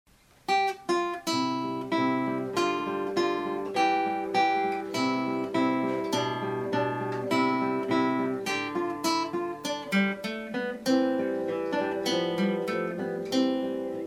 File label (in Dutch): speelt Andante in C; Mauro Giuliani